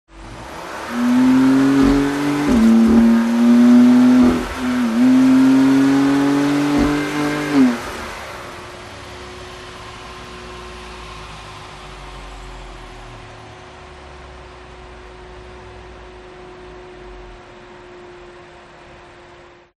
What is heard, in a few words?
CAR BMW M4 Acceleration Full Deceleration; TRANSPORTATION; smartsound